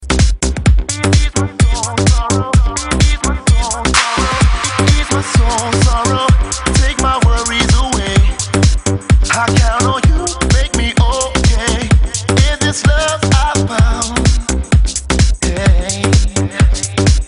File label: Pop